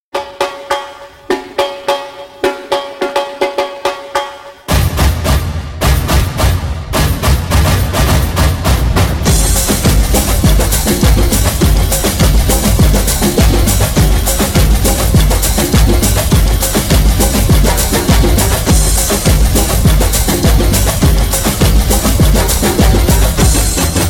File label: batucada